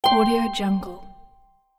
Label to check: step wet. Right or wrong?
Wrong